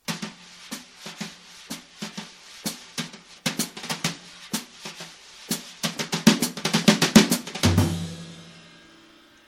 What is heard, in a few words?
jazz, brushes